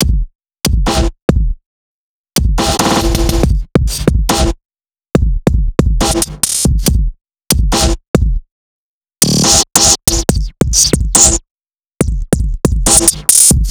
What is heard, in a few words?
drum